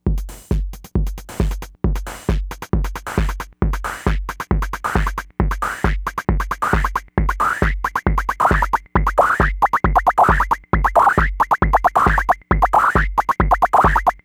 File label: 808 resoverdr